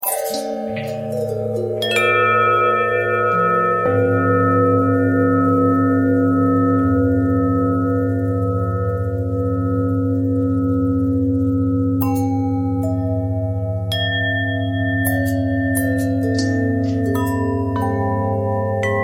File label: bell long bell twist, bells